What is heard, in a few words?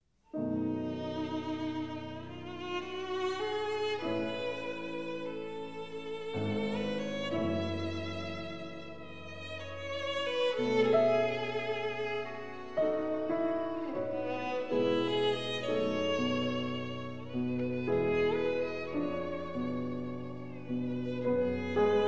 serenade; sinding